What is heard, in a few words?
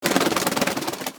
Chicken Wing Flap